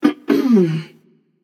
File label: clear throat passion; chaac